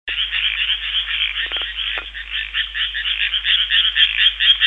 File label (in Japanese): amagaeru